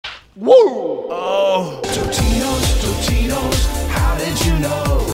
whoo uhh; totinos

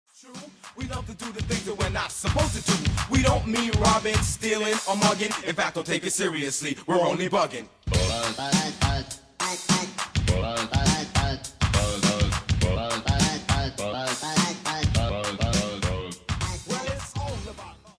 whistle

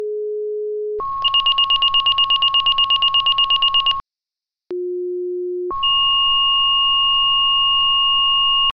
SIREN

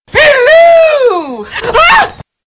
fire scream

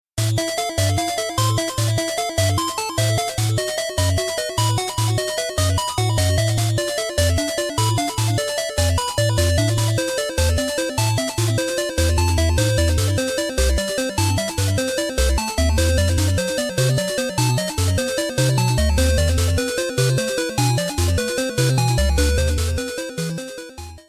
8-bit

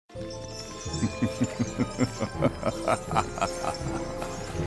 laugh

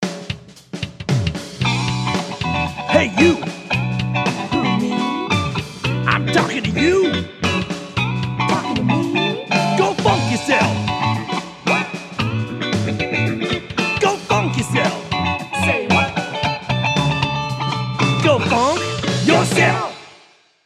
Funk

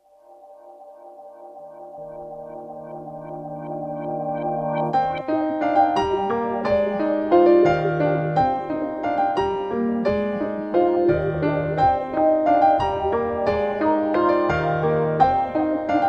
Wind; Naruto

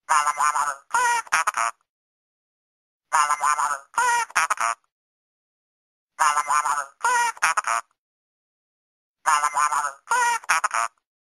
Apple ios ringtone

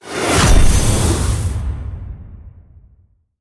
Card Turn; Rare

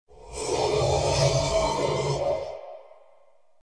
Tonic; Guild Wars 1

